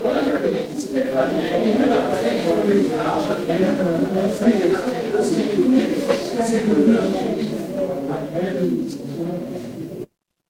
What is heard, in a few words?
crowd murmur